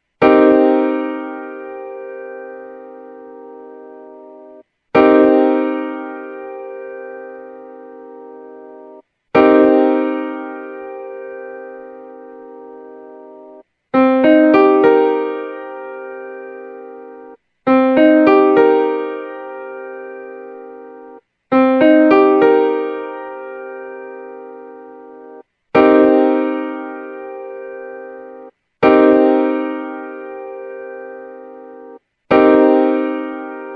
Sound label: Cm7 Piano Chord